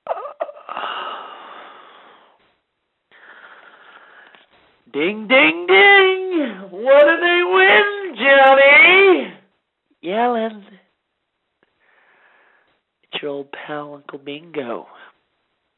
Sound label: DING